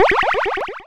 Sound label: Cry